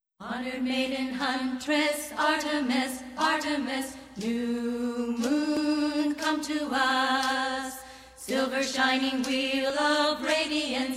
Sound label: Wiccan Chants - Triple Goddess Chant